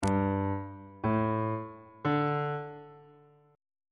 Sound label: g suspended 2nd triad chord intervals on bass clef